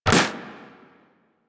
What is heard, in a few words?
Primary Shot, Weapons; Sapient